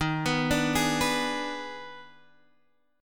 D sharp Major 7th Suspended 4th Sharp 5th D sharp, chord